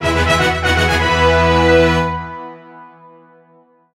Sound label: Jingle Fantasy; ashes of the night